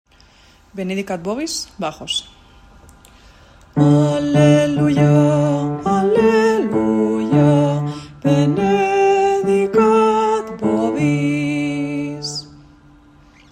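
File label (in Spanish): benedicat bajo; Navidad JOCSMAB 2023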